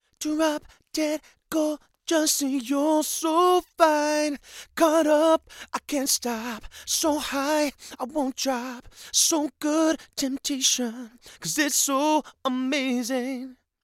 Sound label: male vocals; lewitt